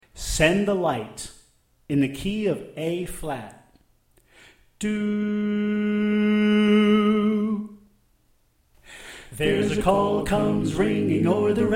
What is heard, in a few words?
Bass Left